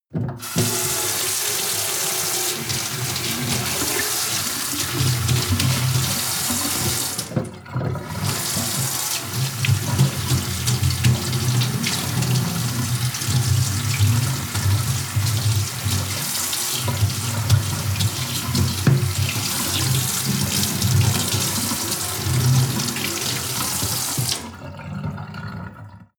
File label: sink water flow clean; industrie-wirtschaft; gastronomie